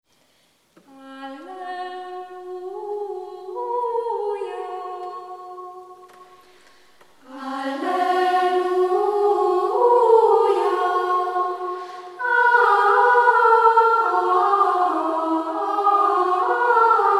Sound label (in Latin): Alleluja dulcis mater, Adventi enekek